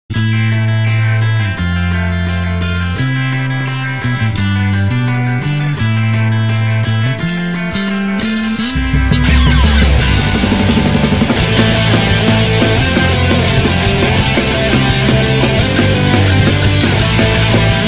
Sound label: Metal Gear Rock